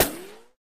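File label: ricochet, guns hits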